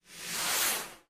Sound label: swosh